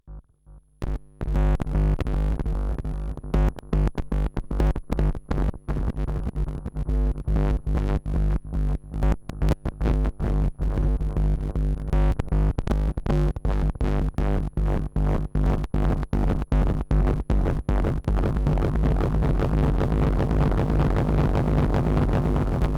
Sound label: cable noise